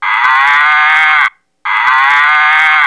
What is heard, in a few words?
cow